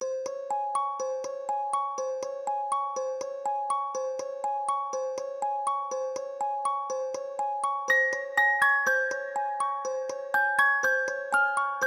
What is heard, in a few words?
Music Intro 122bpm; Sockventure